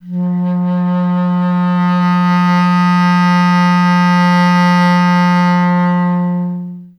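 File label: wind bass clarinet; Miroslav Vitous - Solo Instruments VOL-1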